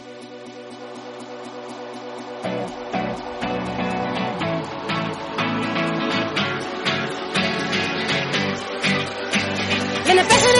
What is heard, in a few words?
hindi song tone ringtone